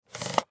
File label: Pc Mouse Movement; Fast Mouse Pad Lift; Computer; Technology; Computers; Blastwave